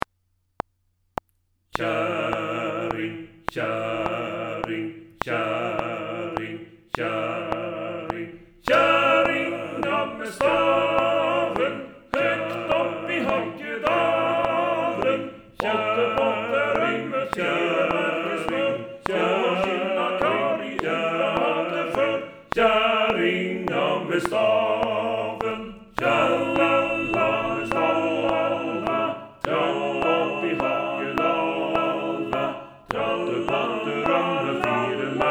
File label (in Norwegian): TUTTI Click; Kjerringa med staven clicktrack